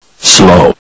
slow; zmParadise; ffvii; seph

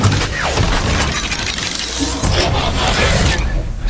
robot; cstrike

